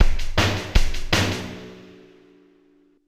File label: drums